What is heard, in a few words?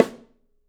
Drums, MARCHING; 3D Drums; OP MARCHING